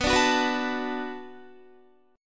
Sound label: B Minor 7th B Guitar, chord; Bm7 strummed